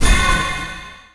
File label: Menu Accept; Menu FX